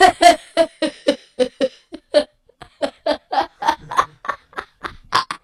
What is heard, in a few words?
Evil Laff; Zero-G; Soundclash Nu Dance Electro; Acidized Wavs; FX Atmospheres